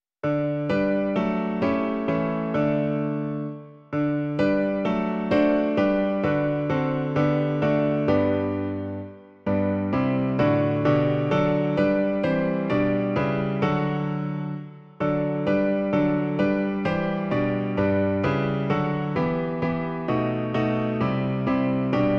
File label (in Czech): Kdo proti nám - klavír; Klavírní verze